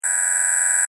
Scanner Beam Ray, Scifi

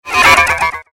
Near Miss Fly By, whoosh; science fiction sound effect; star wars / trek style games; Andrew Fleming

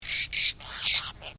Bird Apostle Bird Call; Various Apostle birds; Animals

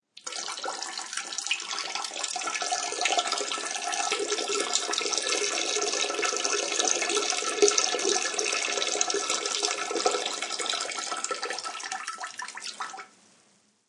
Male urinating.; Human; SFXsource

